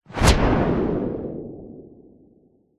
transition whoosh fast; sound design; zapsplat

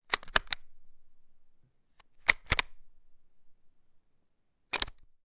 weapon gun ruger 10.22; still north media

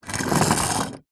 toy train wooden roll wood floor short

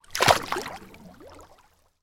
water splash or movement

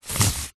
foley cardboard pieces x2 rub together

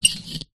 metal small clip slide squeak; foley

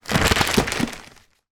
foley wrapping paper roll fast release; zapsplat

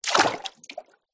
footstep feet jump into one foot water; foley

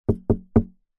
wood 3x knocks hollow; foley

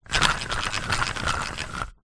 leisure fishing reel turning reeling small; zapsplat

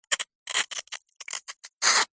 hospital syringe squirt thick gel like liquid

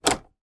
van fan duct vent selector dial mercedes sprinter; transportation; sound effects; sound effects one

